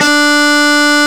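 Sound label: Distorted Guitar D2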